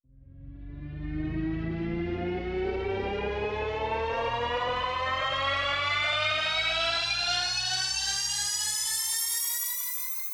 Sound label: riser; M8; riser sfx